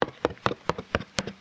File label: Walk